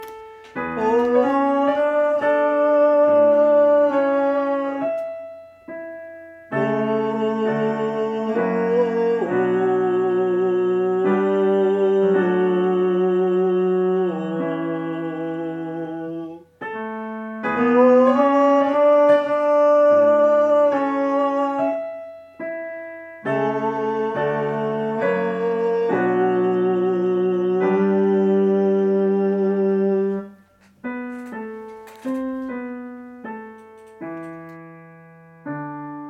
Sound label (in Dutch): zang